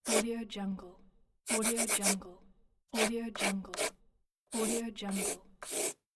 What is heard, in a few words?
pencil drawing lines